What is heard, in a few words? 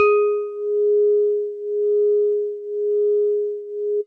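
House Organ DX100 G#4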